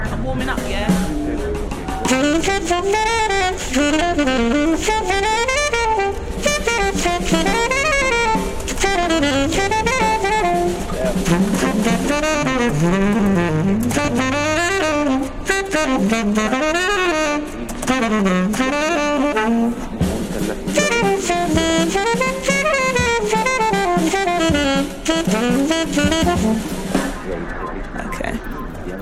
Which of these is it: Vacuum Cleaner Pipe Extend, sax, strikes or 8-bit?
sax